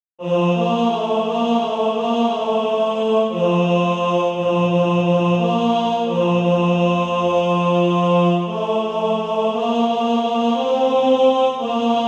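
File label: tenor, SATB Practise Music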